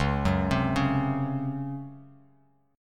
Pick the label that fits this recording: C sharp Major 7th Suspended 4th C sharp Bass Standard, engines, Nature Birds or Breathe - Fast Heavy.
C sharp Major 7th Suspended 4th C sharp Bass Standard